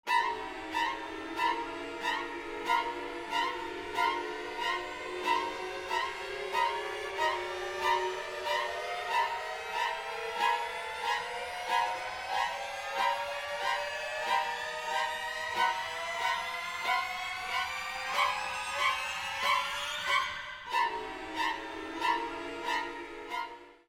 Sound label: BGM Horror; PAPER MARIO Color Splash; wiiu